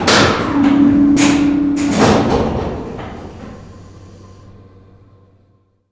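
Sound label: elevator stop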